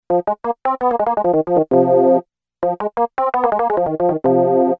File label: organ; CZ-1